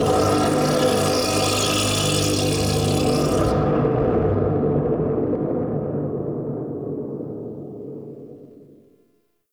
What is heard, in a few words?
Chasm Scream; Ambience; Man In The Wall; Entrati